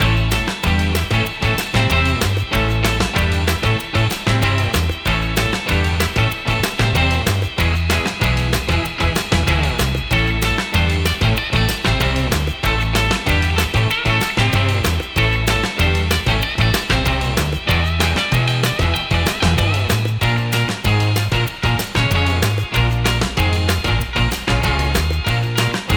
Timer Music; Fakin' It; Jackbox Games; The Jackbox Party Pack 3

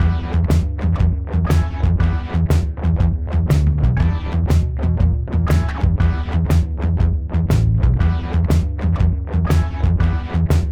Post-Question Loop; Jackbox Games; The Jackbox Party Pack 1; YDKJ

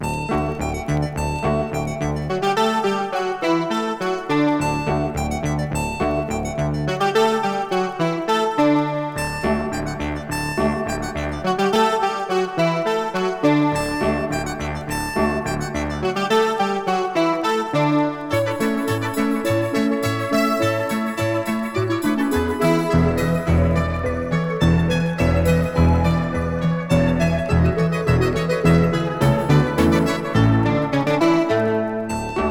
Musette in D Major; Suite From Anna Magdalena Notebook

